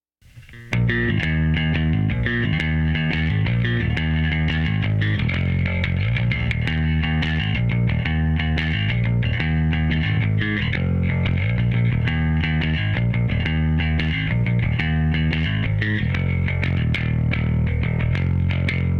Bass

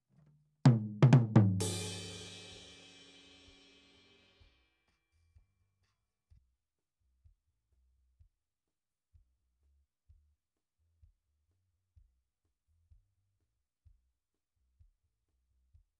Tom

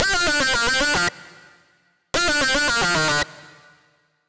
G 112 Summer Lead, Discostep Synth Loops

